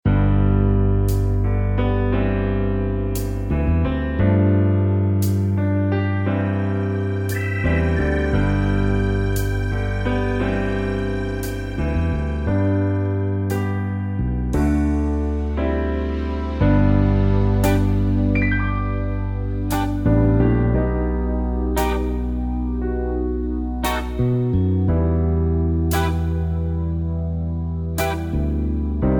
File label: G To Ab No Backing Vocals, backing tracks